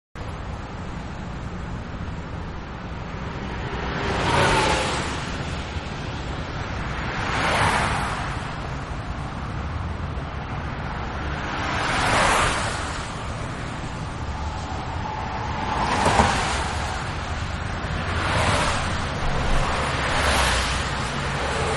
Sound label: Highway-Traffic-Medium; Traffic and Cars; Backgrounds